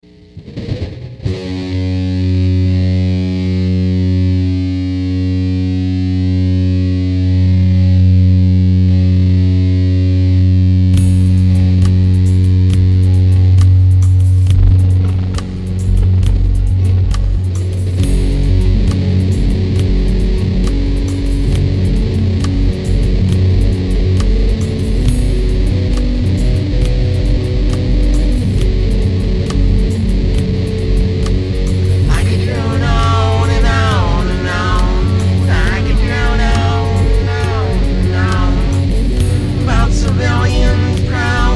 Alternative Rock; Ail Whale Wail

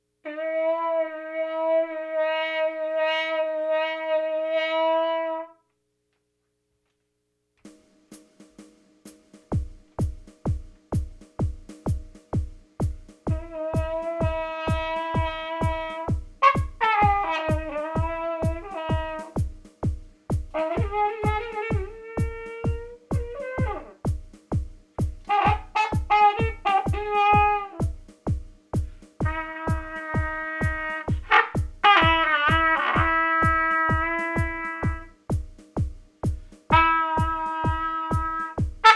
Blow Your Horn; hyper terrestrial

